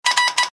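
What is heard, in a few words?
iphone text message sound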